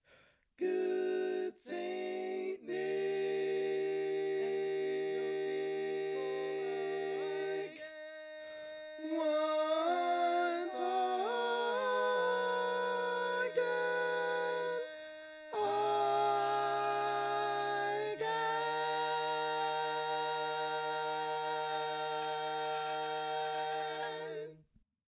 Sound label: tenor; Extended Version; Each recording below is part predominant - one part louder, other parts quieter.